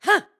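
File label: Jump Attack, voice; Link's jump attack; BOTW; Breath of the Wild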